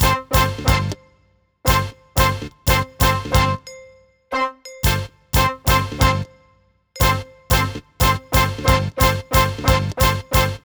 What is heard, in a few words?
Melody Main; Cinematic Funk; Sonic Foundry (Sony Creative Software); Urban Tone Poems